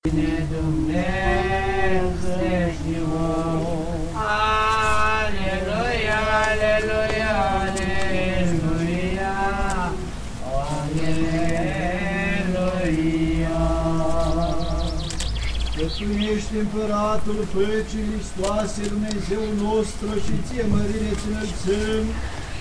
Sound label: outside church